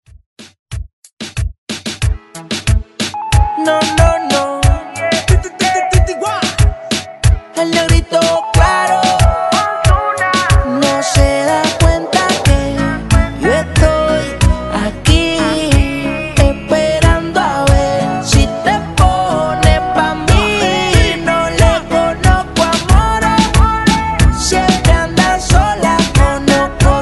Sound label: Reggaeton Redrum